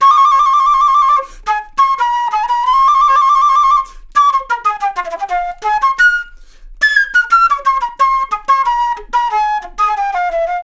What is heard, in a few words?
Flute Solo, Jazz; Flute Loops; Sonic Foundry (Sony Creative Software)